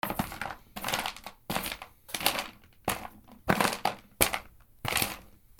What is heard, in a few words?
FOOTFALL